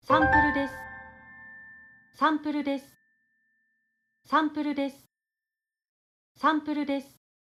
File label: Piano